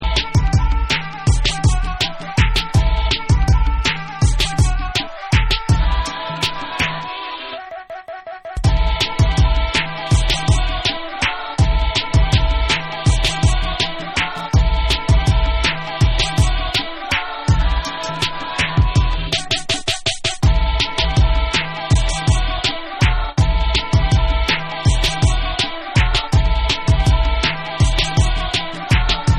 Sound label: Instrumental